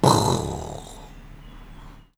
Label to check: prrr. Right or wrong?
Right